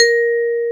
A#3, C PERC; AKAI S-Series CD-ROM Sound Library VOL-8

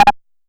Elektron Monomachine, Drum Machines

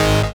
SYN TEKNO, dance synth; Sound & Vision - Gigapack I CD 2 (Roland)

